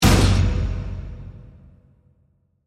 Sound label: Hit Industrial, Hit, Industrial, Metallic, Crunch, Slam, Dark, Accent, Transition; Production, Element, Imaging; BlastWave